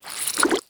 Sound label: spider alert; granny; zerosiki; slender